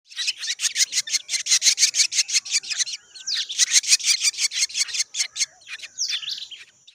Ashy drongo; بوچانگای خاکستری

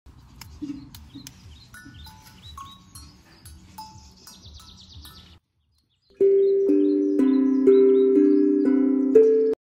Tang drum

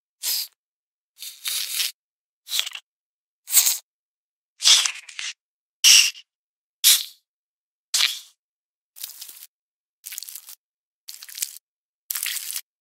Silverfish, ماینکرافت

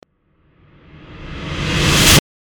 WOOSH BUILDING

